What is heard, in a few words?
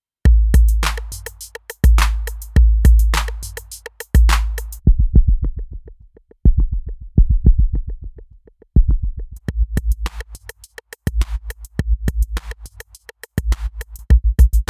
Roland MX 1 Beat BFX Clean Filter SC Slicer